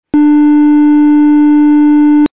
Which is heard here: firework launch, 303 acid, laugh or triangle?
triangle